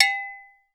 WATER BOT, WATER BOTTLE

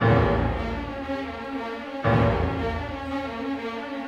Orchestral Loops; 128 MANHATTAN

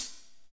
HAT, Drumscapes Roland

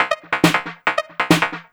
drum, 138, drum-loops, Techno

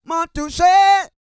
SING, World Voices, CARIBBEAN

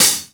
Hi Hat; Sonic Foundry (Sony Creative Software); Drums & Drones; Construction Kits; Pull Kit

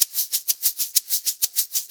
Blues Perc Loop; Perc Loops; Drum Tools

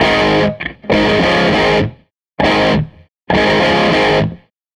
Tele Guitar Loop

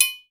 TRIANGLE, Latin Hand Perc; E-MU Producer Series Vol. 2; More Studio Essentials; Composer

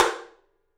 TOM; 3D Sound; 3D Drums

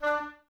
Oboes; Miroslav Vitous - Woodwind Brass Ensemble; Wood Winds

